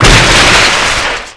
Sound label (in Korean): 빙정락